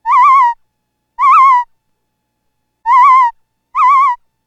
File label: theremin